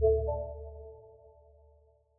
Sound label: app start; wayvr; dash frontend